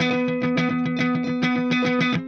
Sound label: Clean Funk Guitar